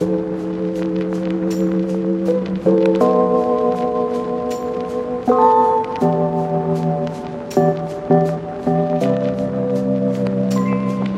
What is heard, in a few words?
Lofi